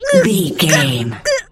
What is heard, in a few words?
voice female death